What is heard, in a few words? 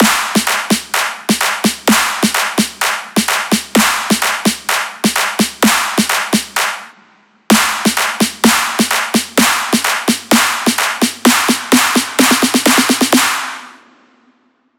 build up big; paragon